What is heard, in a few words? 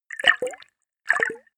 bubble and splash